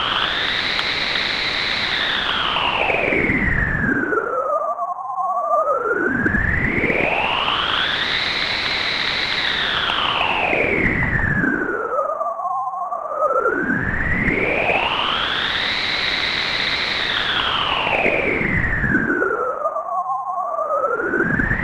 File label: A114 noise sweep; CGS